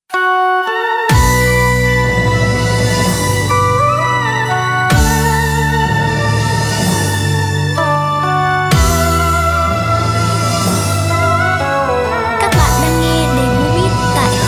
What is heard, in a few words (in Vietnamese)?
beat